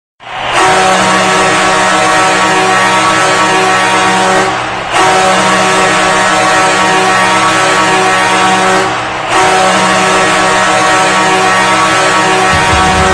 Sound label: Goal Horn; Red Wings